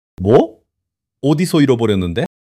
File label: surprise